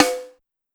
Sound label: Snare, Drum Machines, Clavia DDrum AT, Pitched Up